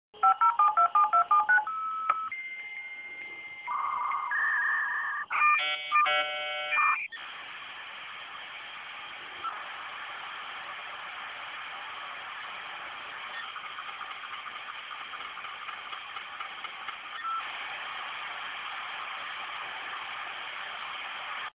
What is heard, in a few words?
modem